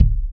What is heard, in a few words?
BS CHPSTK, Chapman Stick; Roland L-CD701